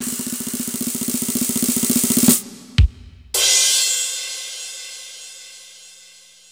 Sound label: Drumroll